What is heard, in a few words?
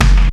POWER HIT; E-MU Producer Series Vol. 2; More Studio Essentials; Composer; Technopourri